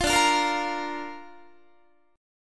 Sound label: D Minor Sharp 5th D down Guitar; Dm#5 strummed; 20,22,20,20,21,21; JGuitar